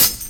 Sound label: raw pedal hi hat single hit e key